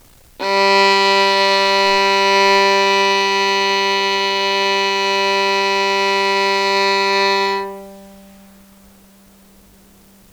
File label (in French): violon sol